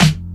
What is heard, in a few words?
pad, drum sounds; virtual drum sounds